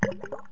menu popup

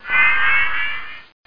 navigation on

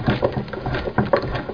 SOUND SCREW

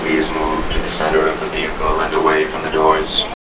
ROBOT

